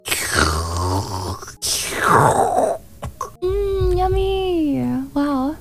slurp

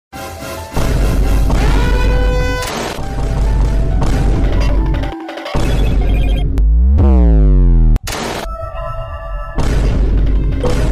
bass boost; meme

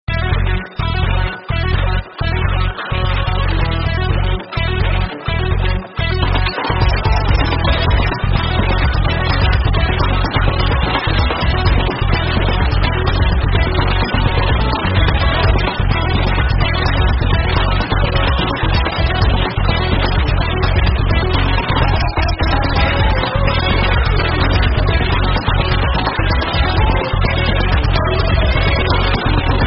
funk goes on

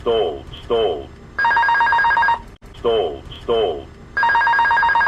plane stall alarm

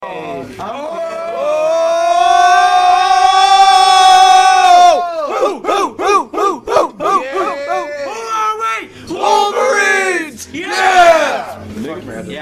chant; the wolverines

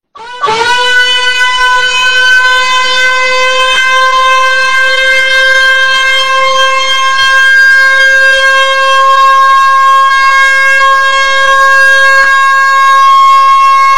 air raid; prank

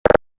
button; gmod

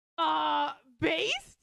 uhhh

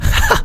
laughing shot; vanoss

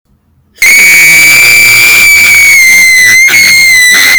ear destroyer

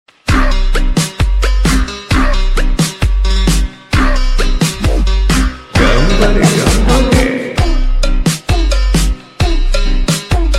badass songg

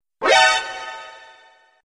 new challenger alert

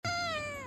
meow

